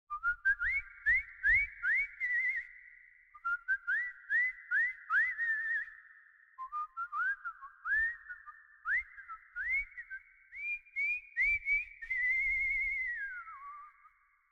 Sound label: Whistling